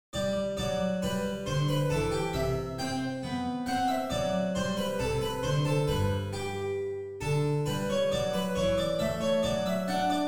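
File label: bourrée, G major; sol majeur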